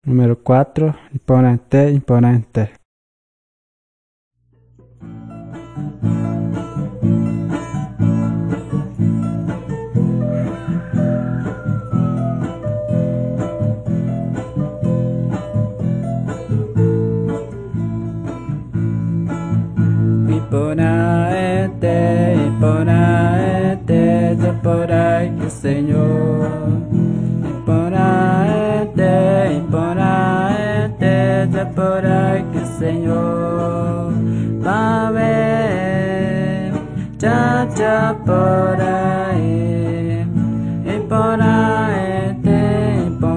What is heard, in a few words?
songs guarani mbya; bible